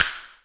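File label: Fire Cracker, Drum Machines Alesis D4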